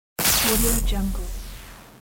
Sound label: electric